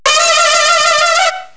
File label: trompet